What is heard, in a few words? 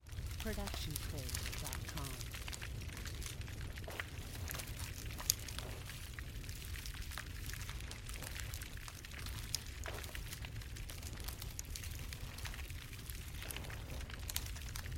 Indoor Fire; SoundsCrate